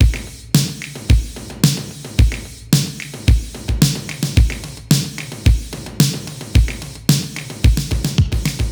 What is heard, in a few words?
Drums Loop only Drums